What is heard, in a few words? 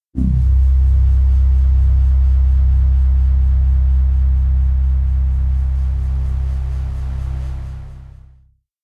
Pulse Bass No Trem